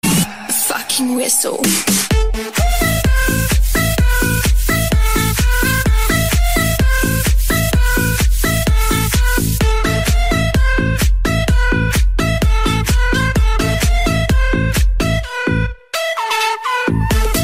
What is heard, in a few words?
mad electro ringtone